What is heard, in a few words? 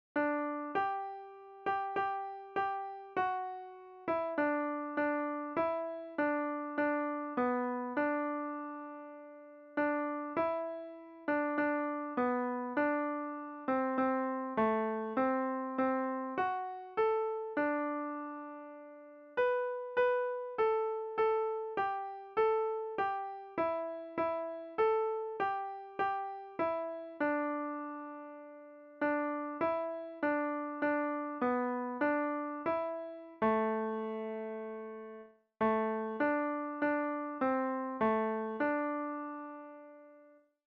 hymn melody